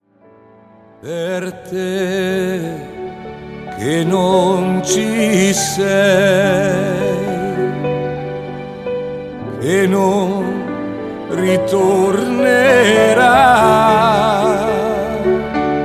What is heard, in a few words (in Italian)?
Piano e voce